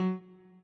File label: chord